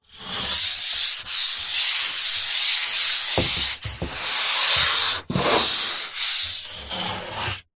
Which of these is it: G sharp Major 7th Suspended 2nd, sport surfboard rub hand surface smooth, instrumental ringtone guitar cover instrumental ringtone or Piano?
sport surfboard rub hand surface smooth